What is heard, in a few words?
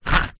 leisure fishing reel turning reeling small short; zapsplat